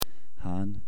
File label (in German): hahn